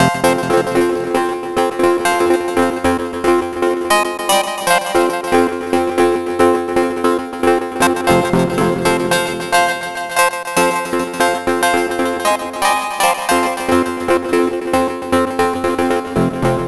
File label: Synth, Rock